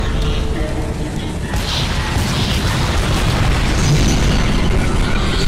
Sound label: shooting; rocket g clone